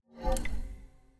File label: mob spawn